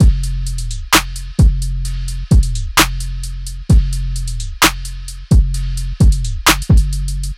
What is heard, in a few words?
Drum Loop 130 BPM